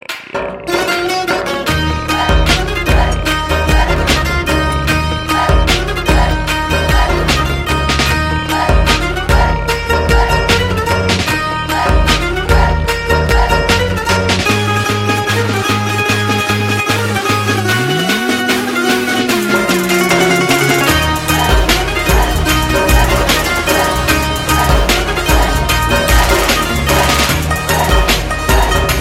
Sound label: Instrumental Tone Ringtone